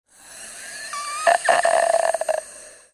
asthma lungs

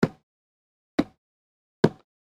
axe

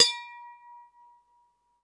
glas

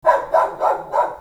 doggy

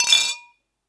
glas

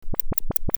loop lfo

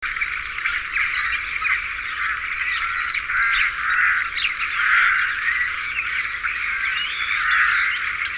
birds

